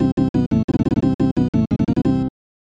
Unused Jingle; Super Double Yakuman; SNES

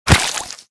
Chicken Death

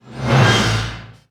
Fight Stinger; Horde; Endor

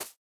Faceplant; Boomerang Fu

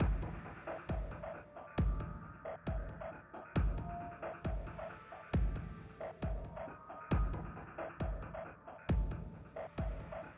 BGM Mission Stage Rocket Loading

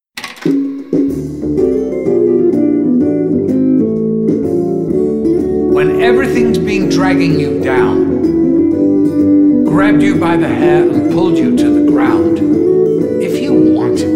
Quick Revive Jingle; Monty; Call of Duty Zombies

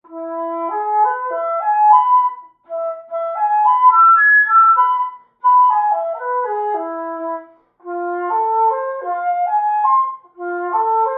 Wind Instrument; Assassin's Creed Syndicate

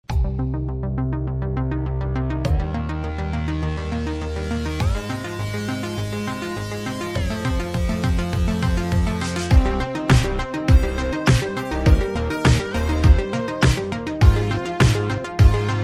80s Vibe Theme; Kahoot

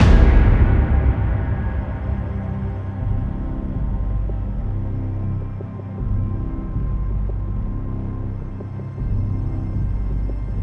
Ambient

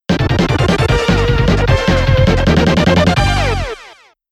Stage Clear (Retro); Wild Guns Reloaded

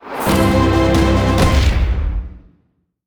Win Short

